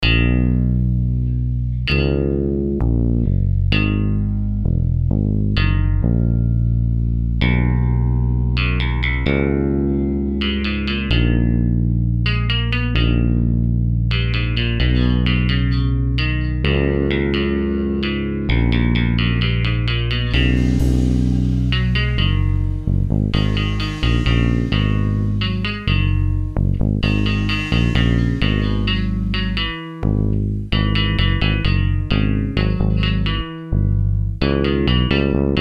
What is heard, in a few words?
playing flute off the shore